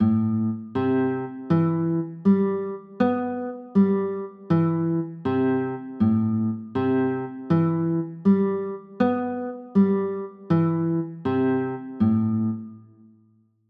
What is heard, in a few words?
a m9, chords